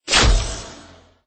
attack

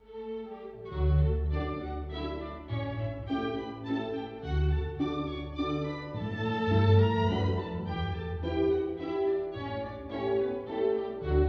movie classical; The Sims 3; Windows

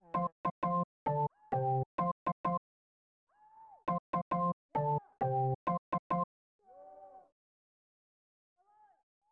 Organ Music; Troy Aikman NFL Football; SNES

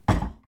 crate

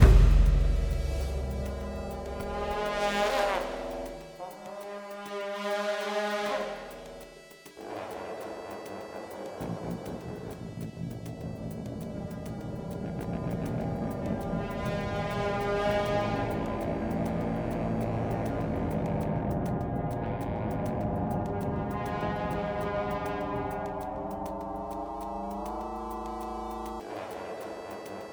Music Gameplay State Tension; Music Hideout1; H1 Level Area=Building2 1st Part

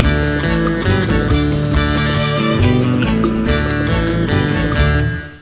Western Stinger; freddi fish 4 the case of the hogfish rustlers of briny gulch

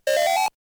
Credit Sound; Phozon; arcade

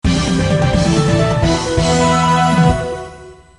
Level Clear; hey you pikachu gamerip

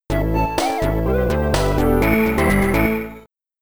Antarctica Jingle; Ultimate Pinball Quest; Amiga

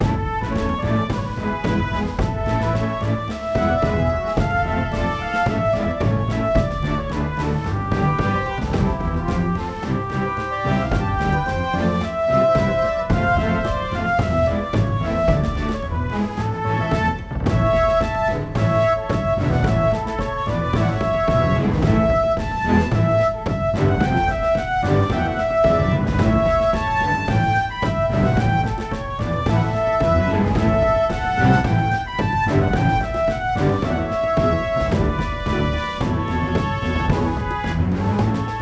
Duel Music Good

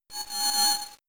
Confusion

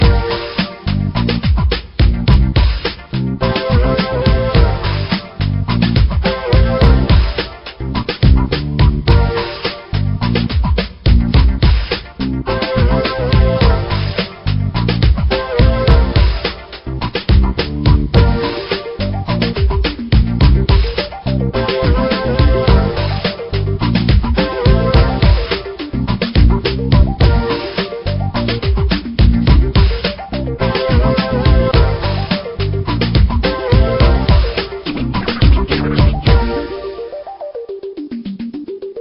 Heaven Background

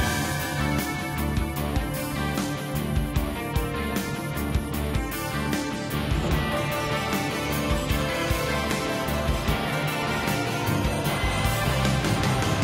BGM Dungeon Hard

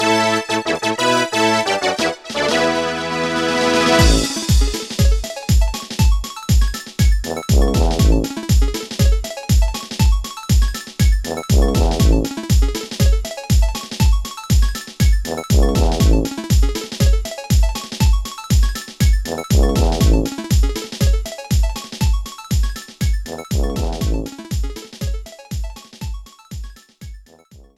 Game Winner; bomberman ultra live gamerip

